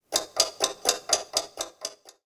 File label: Ticking Attack Underlay